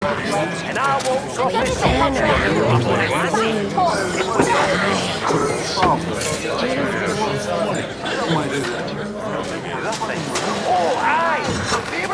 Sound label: Tavern Walla Loop; Under City; Silent Rose